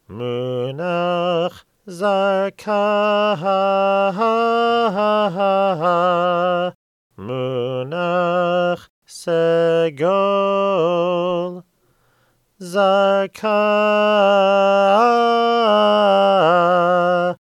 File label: Torah Trope Haftarah Zarka Segol